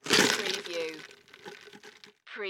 Cup Pick Up Movement

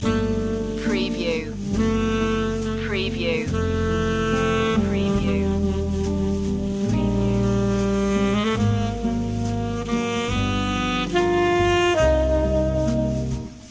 loop

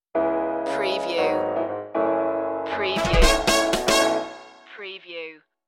fill the floor stinger

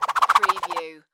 Whip Boomerang Whoosh; Sound Effects Bible

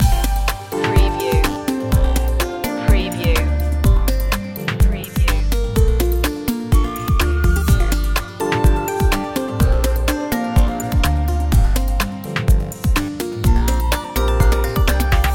loop, chill

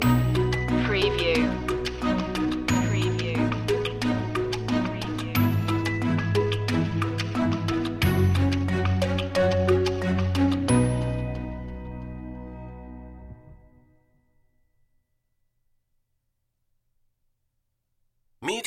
creative impulse stinger

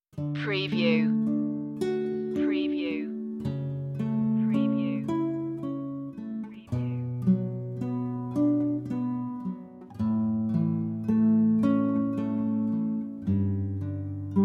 relaxation no sound-fx no choir

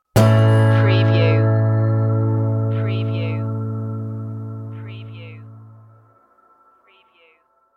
rising up stinger

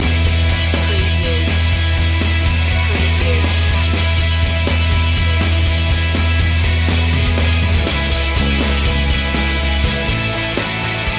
flying solo loop

